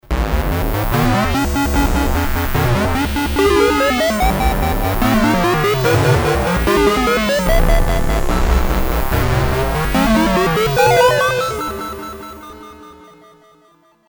fx 8bits, Roland JD800

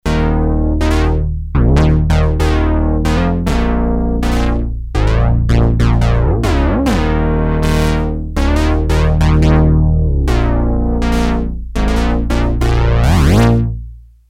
synth, Creamware minimax

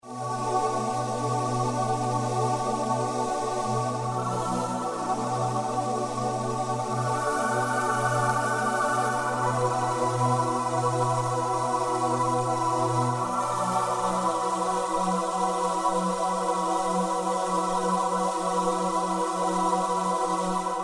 voice pad, Yamaha TG-500

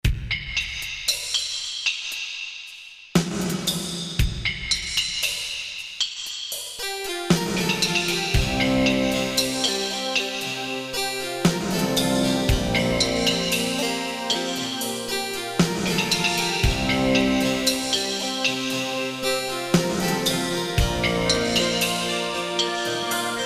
korg m1r synth

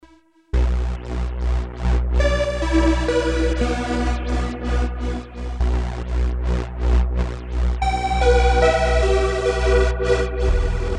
synth lfo; Solton SM100

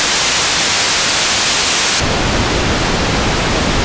noise white pink; Creamware minimax

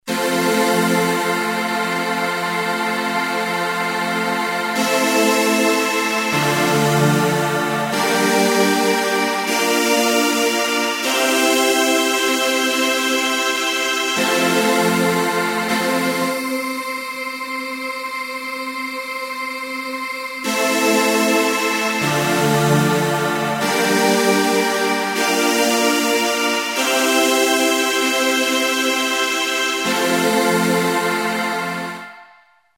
AMBIENT: abyss, Roland JD800

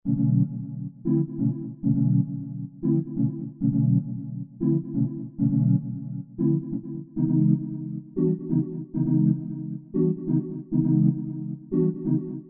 chill; roland mvs1

